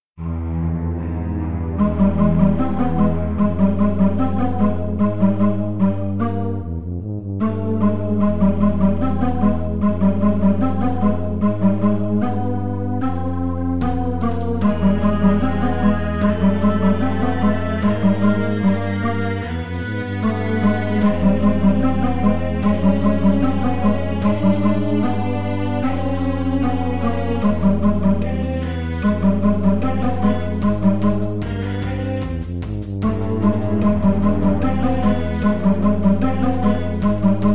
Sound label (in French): suspens